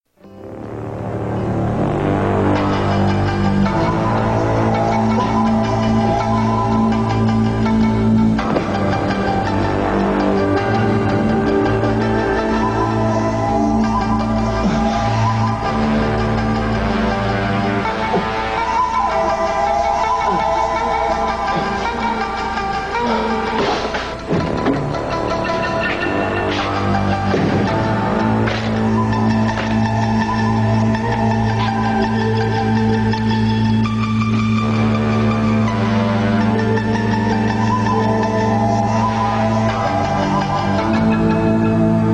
Asian theme

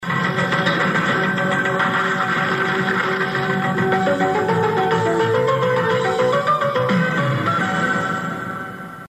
action theme ender

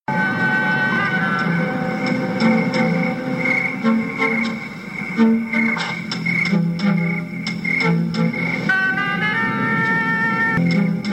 sad theme

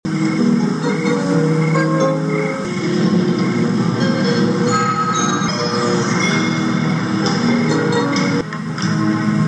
romantic theme

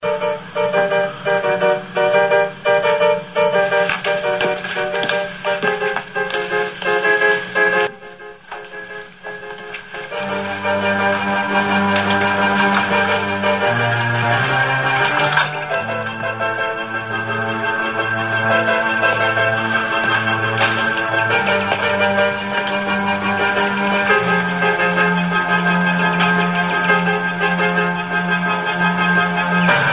dramatic theme

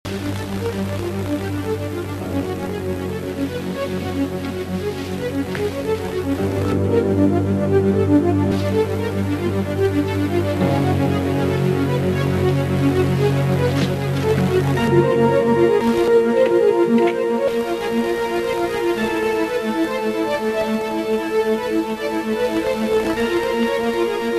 dramatic theme

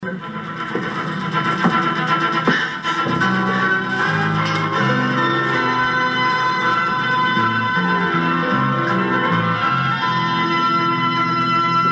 scene ender dramatic

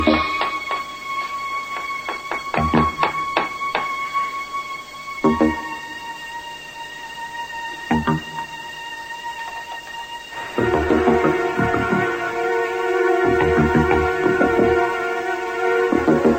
suspense

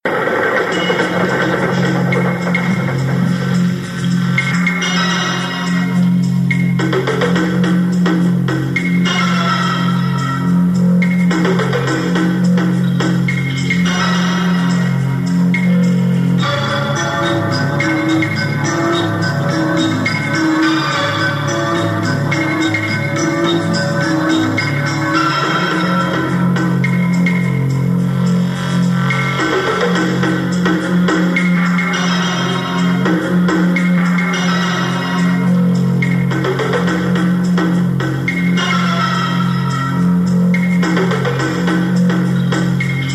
creepy suspense

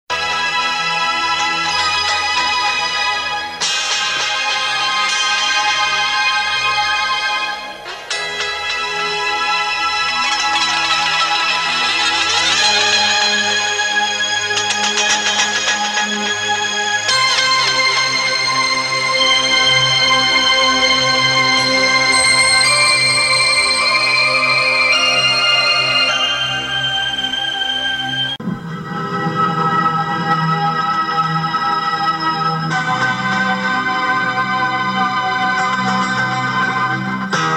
church theme

